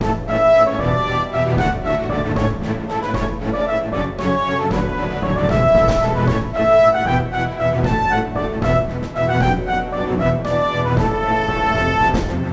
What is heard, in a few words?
Duel Music Intro